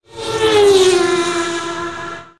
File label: truck sound; Road-Fight